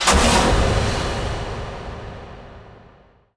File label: sfx sci fi; Masterbits - Switch FX